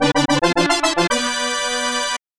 Bonus!; pipe dream snes

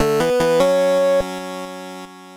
Level up; Knights of Tartarus

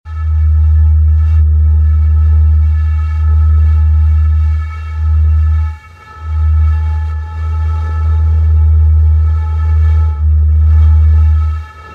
Whistle Stone Champagne D sharp Loop; Journey

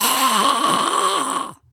Grave Zombie death

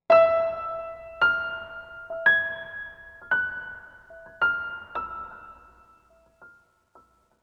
One shot Piano; zombie army 4 dead war; ps4 stadia switch windows xbox one

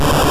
Noise; puchicon; dsi ds

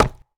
Hit Generic Wood

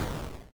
explosion; Eleven: Stranger Things The Game

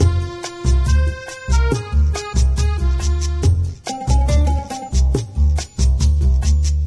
Italian Music; Diner Dash 2 Restaurant Rescue

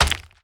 Warrior Damage; we are the dwarves gamerip 2016

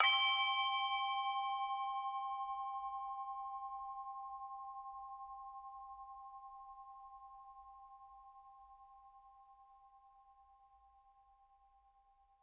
Level Start

